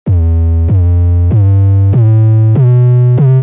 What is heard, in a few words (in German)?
c dur tonleiter mit 808; 808 Producing Guide